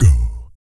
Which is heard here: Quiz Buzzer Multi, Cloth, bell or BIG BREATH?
BIG BREATH